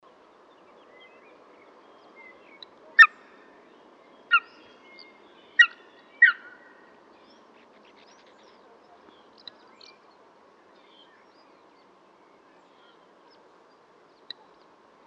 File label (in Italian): Aquila reale; valsolda